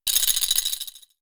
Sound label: Shaking Ring; Huawei Honor 3; Message tones